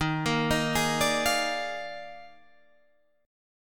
D sharp Major 7th Suspended 2nd Suspended 4th, down, Guitar, Drop D